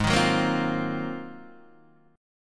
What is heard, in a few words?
Ab 13th Guitar DADGAD, Ab13 chord; strummed; JGuitar